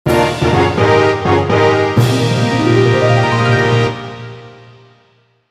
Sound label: Completed Colosseum Fanfare; Pokemon Battle Revolution; wii